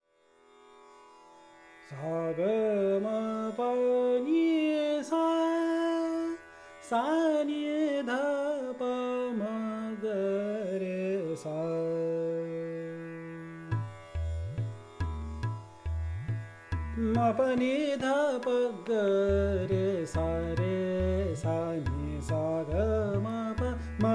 Raga Bhimpalas Vocal; Bansuri Student Zone

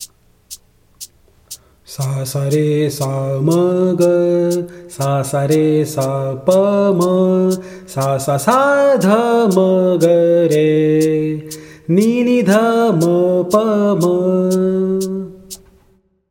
Happy Birthday Vocal; Bansuri Student Zone

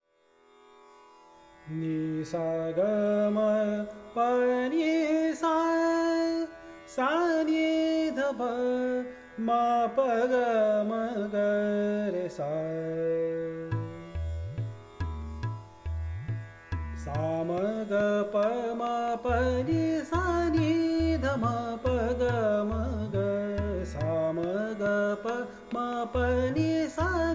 Raga Bihag Vocal; Bansuri Student Zone

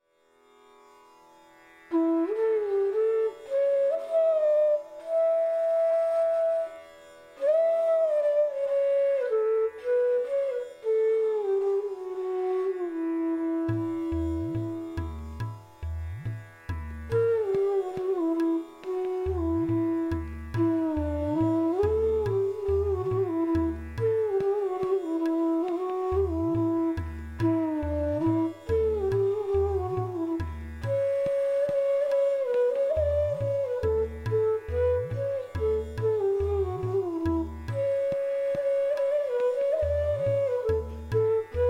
Raga Bageshree Flute; Bansuri Student Zone

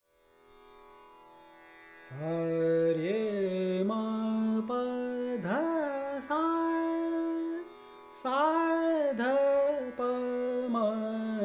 Raga Durga Vocal; Bansuri Student Zone

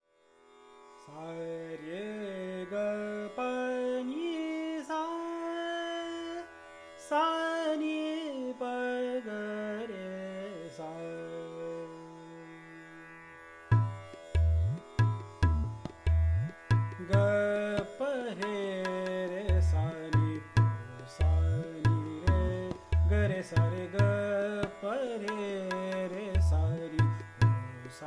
Raga Hansadhwani vocal; Bansuri Student Zone

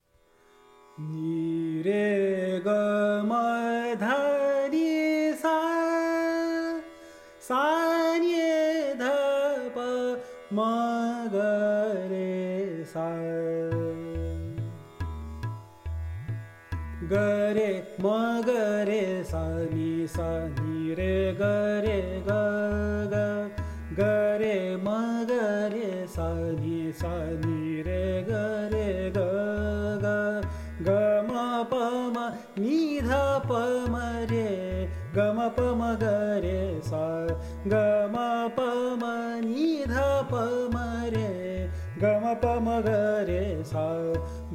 Raga Yaman Vocal; Bansuri Student Zone

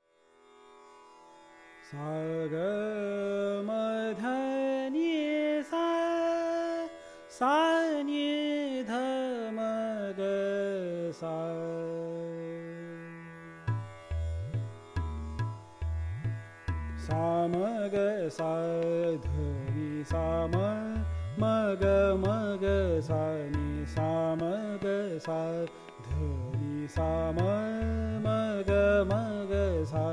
Raga Malkauns Vocal; Bansuri Student Zone

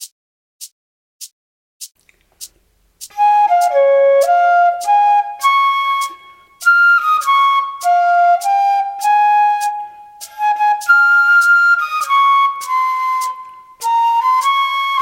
flute; US National anthem; Bansuri

